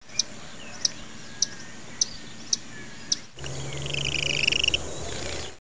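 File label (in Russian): птица мухоловка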